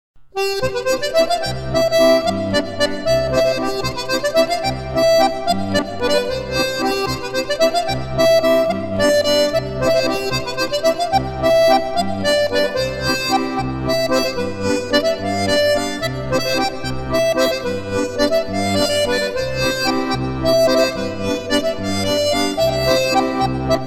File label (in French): bourree